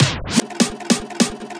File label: Dubstep Fill, 150bpm; Ghosthack; Dubstep Sound Arsenal